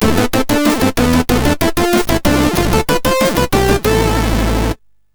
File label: Power sid MOS6581R3